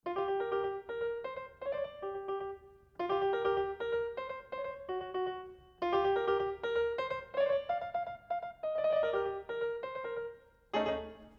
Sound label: FIR comb